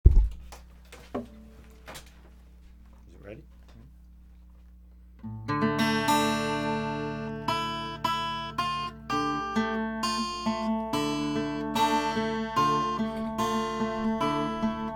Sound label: rays raga guitar; experiment with alternate guitar tuning